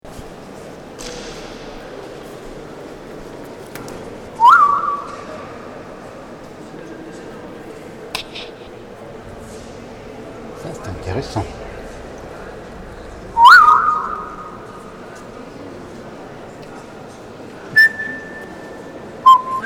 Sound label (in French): echo flottant Bilbao; gugg SERRA